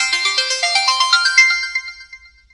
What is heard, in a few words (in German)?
Handy; Bergdoktor